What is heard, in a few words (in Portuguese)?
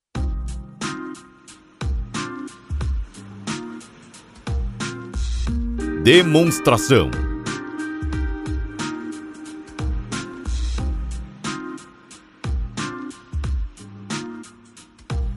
Trilha